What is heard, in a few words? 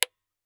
Very small speaker mono; Speakers and telephones